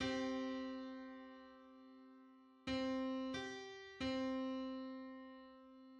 Ninety-fifth harmonic on C; 683.84 cents

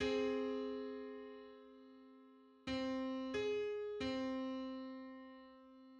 Fifty first harmonic on C

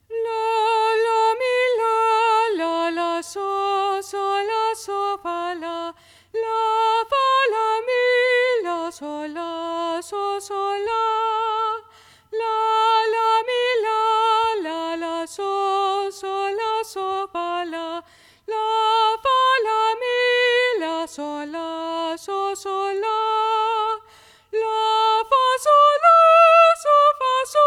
Star in the east solfege

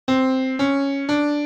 C D E

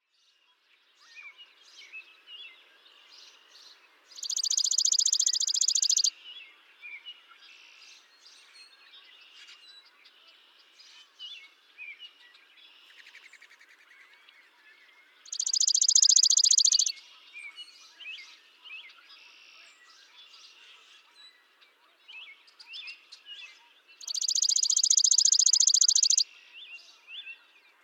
Spizella passerina

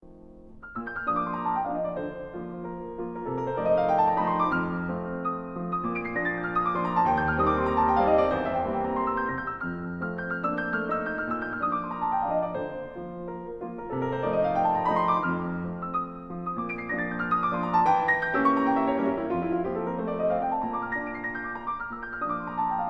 Schubert Impromptu B-flat

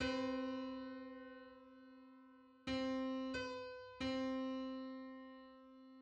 Hundred-twenty-third harmonic on C

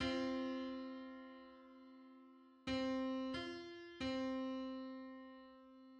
Hundred-ninety-fifth subharmonic on C

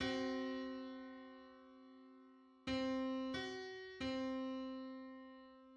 Seven-hundred-thirty-fifth harmonic on C

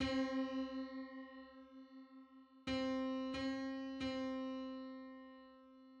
Two hundred sixty first harmonic on C

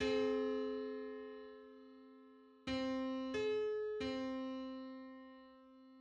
Eight-hundred-thirty-third harmonic on C

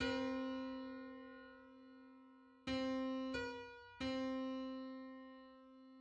Two hundred thirty fifth harmonic on C

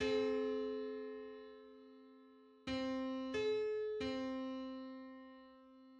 Eight-hundred-thirty-seventh harmonic on C

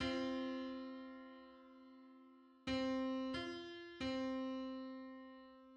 Twenty first harmonic on C; MIDI

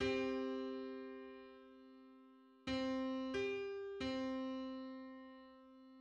Hundred-sixty-ninth subharmonic on C

